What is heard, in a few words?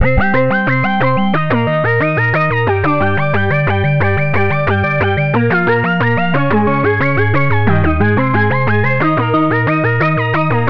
arpeggio